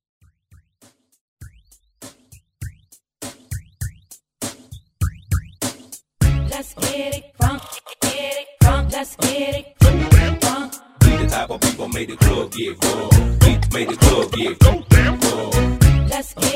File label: Throwback Mash Up